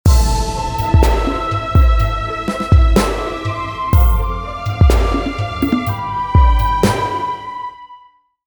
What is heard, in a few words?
Reflective Stinger